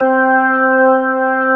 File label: B3 C 3, organ; AKAI S-Series CD-ROM Sound Library VOL-1